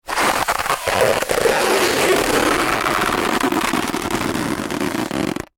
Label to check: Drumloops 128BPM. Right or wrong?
Wrong